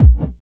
NOISE BD, bass drum; BASSDRUMS

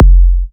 Minimal Kick, Drums, Kicks

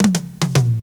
tom fill 114, drum; BREAKS

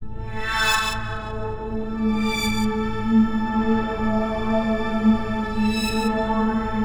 TECHNO PAD 140; Keys

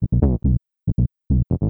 TECHNO BASS 140 A

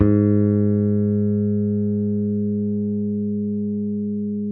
BASS G#2, ELECTRIC BASS

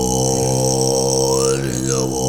monk, ETHNIC STUFF

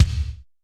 BD, bass drum; BASSDRUMS; BD PRINCE

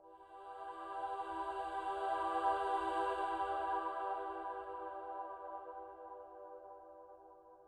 SYNTH PAD ETHNO 125 A, Sphere

